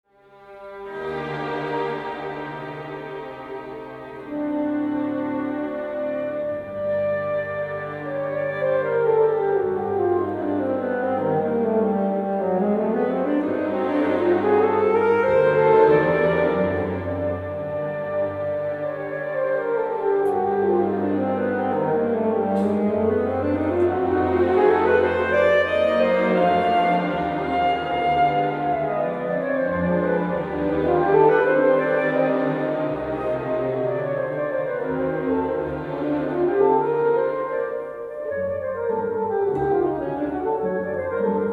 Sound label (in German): Konzert f Saxophon u Orchester